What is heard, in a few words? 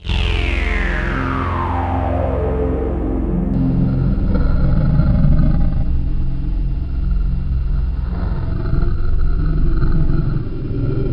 monster